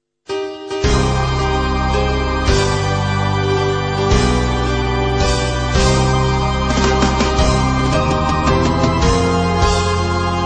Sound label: Happy Birthday To You Heavy Metal Arrange